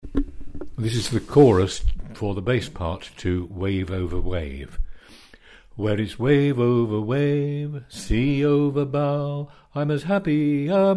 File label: bass